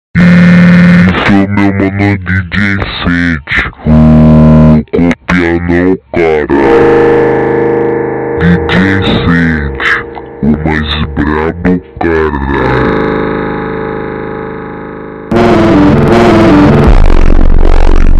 Funk super Slowed

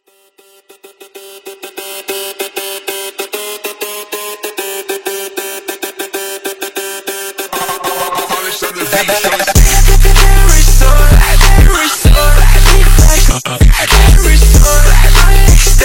Slowed, funk

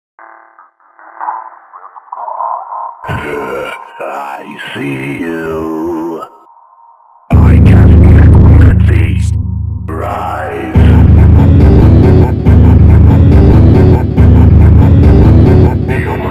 Funk, bassboost

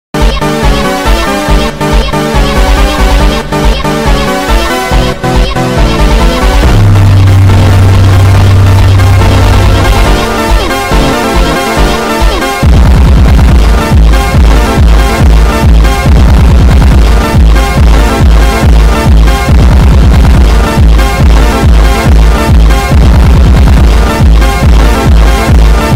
FUNK bassboost

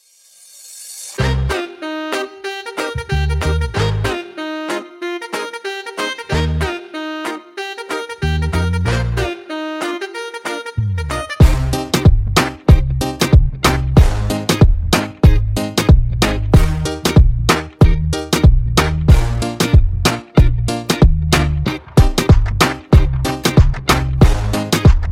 Siren Beat Remix instrumental speedup

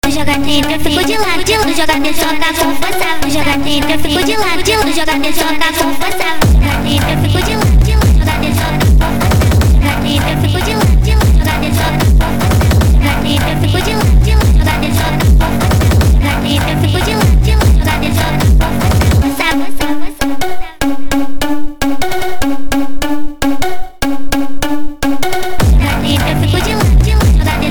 Sped Up, phonk

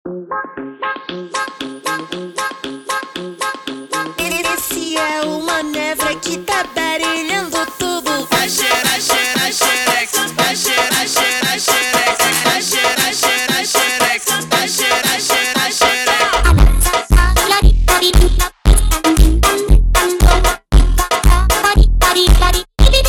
Funk slowed

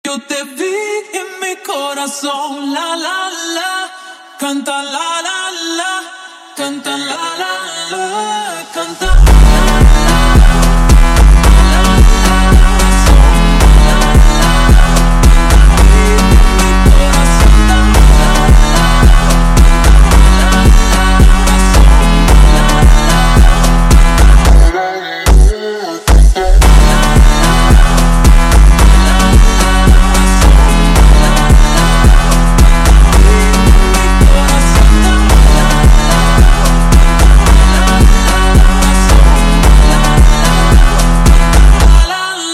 ultra Slowed

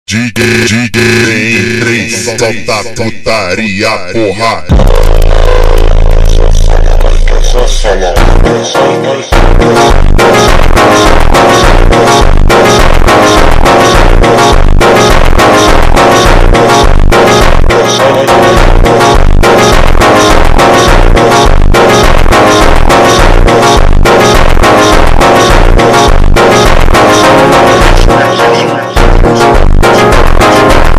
Funk slowed

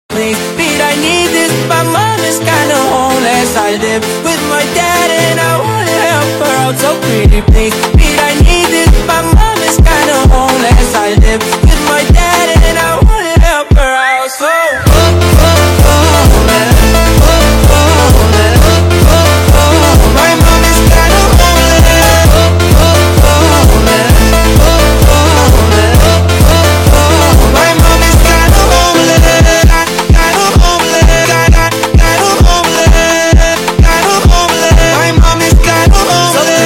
FUNK Sped Up

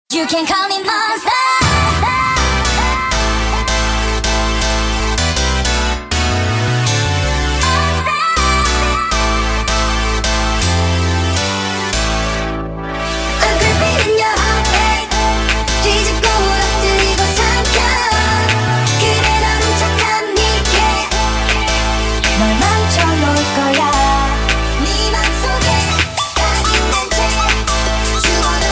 Noise Creeper Bass Remix, Nightcore

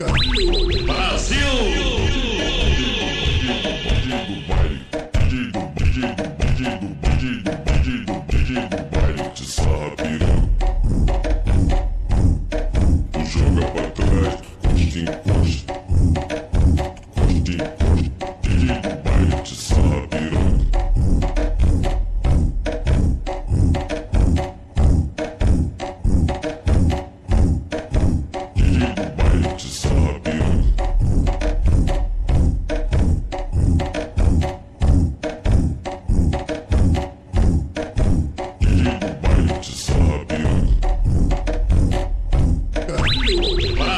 Slowed slowed reverb

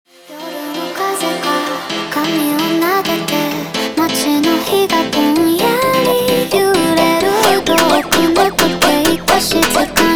Jumpstyle

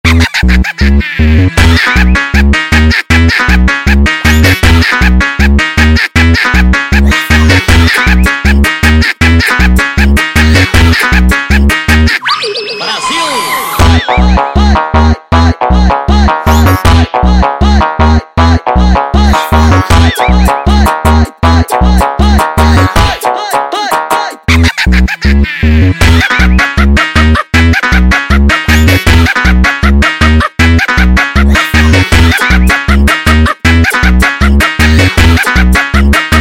Meme Funk sped Up

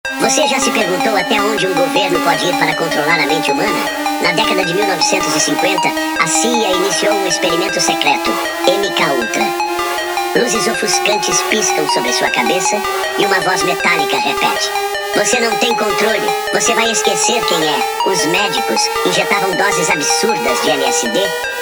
phonk sped up

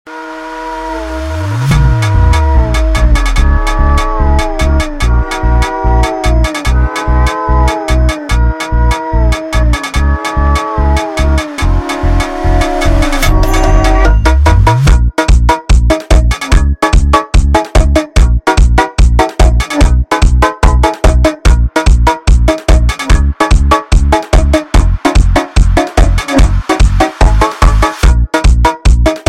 Meow Scary Funk instrumental Version Sped Up

